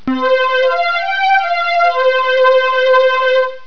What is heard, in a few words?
STRING